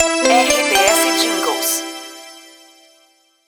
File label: news ID; Pacote 012 News, de São José dos Campos/SP Estação com característica All News